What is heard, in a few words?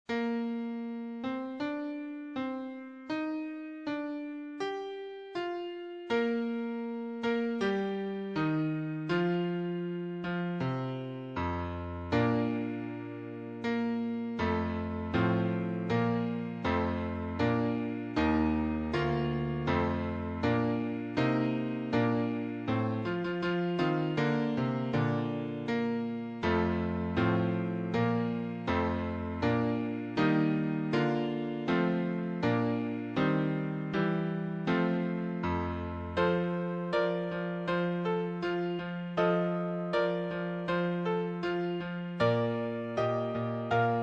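Trumpet Emperor accompaniment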